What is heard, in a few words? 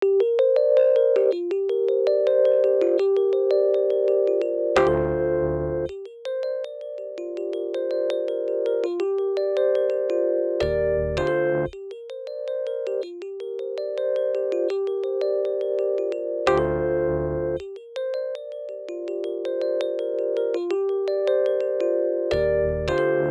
rhodes A, Smooth RnB; Big Fish Audio; Legendary; Shes Mine